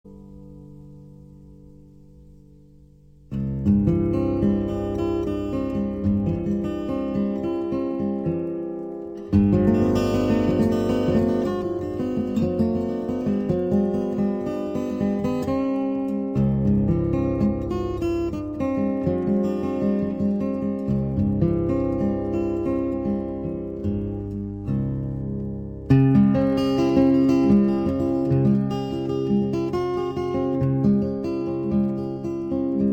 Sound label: Hawaiian Slack Key Guitar